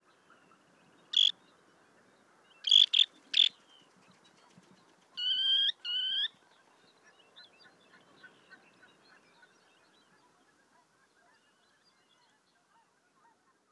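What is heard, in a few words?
adult flight alarm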